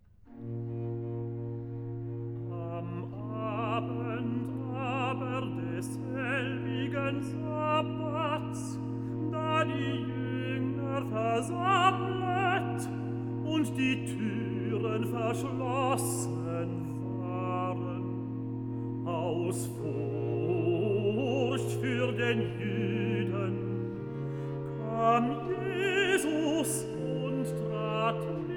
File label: Recitative; Cantata BWV42 Am Abend aber desselbigen Sabbats; Bach; J S Bach; Koopman Complete Cantatas Volume 14